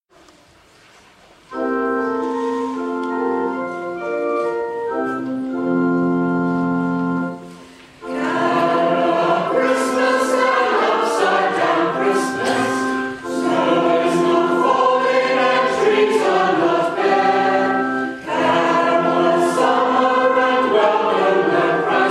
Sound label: choir